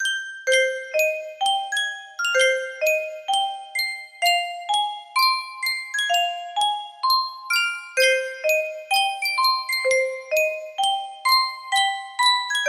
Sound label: Yunsheng custom tune music box